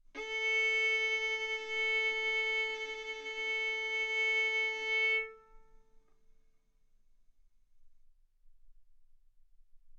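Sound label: Violin arco mf A string A4 B4 stereo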